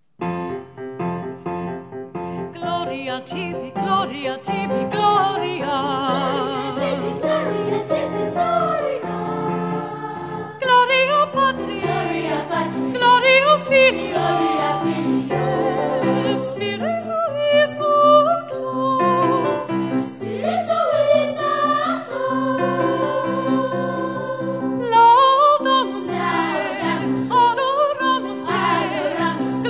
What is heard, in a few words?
Mass w